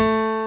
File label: piano A